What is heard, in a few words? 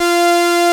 Moog modular; Greytsounds VOL-1